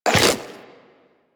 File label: Step Goo, Footsteps Walk; DE; Warframe; Tenno